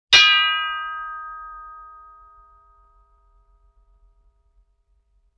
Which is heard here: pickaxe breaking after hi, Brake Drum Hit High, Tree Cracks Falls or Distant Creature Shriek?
Brake Drum Hit High